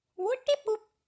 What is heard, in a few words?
boop